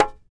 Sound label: TAR; Roland - LA Composer vol. 3